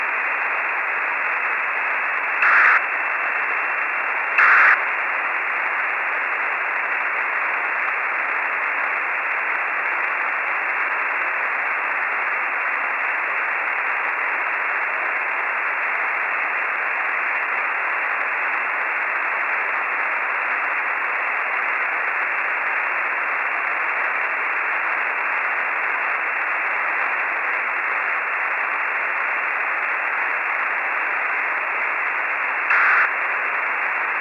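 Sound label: FIREBIRD, satellite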